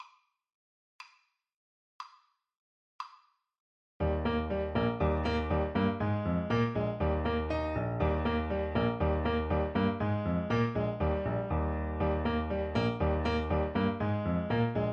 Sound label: ecossaise, Clarinet